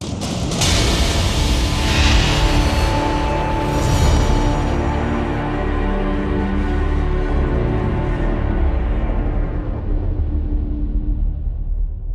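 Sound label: Sting Intro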